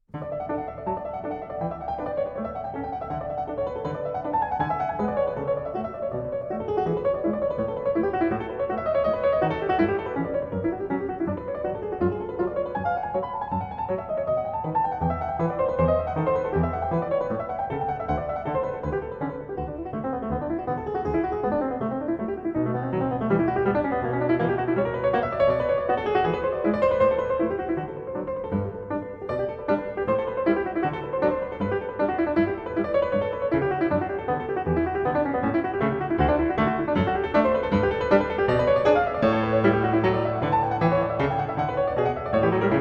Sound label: Prelude Fugue in D Major; Well Tempered Clavier Book I No. 5; BWV 850